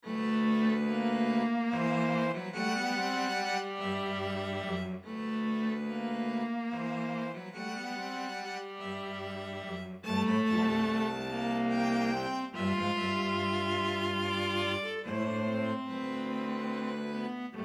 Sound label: string trio